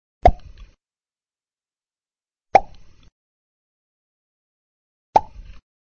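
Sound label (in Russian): поп корн мягкий близкий звонкий восходящий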